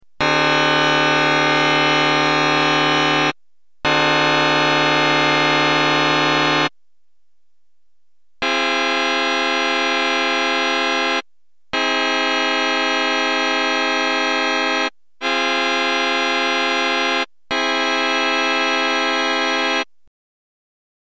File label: minor test